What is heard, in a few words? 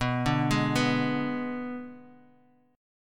B Minor 6th B, Guitar Half Step Down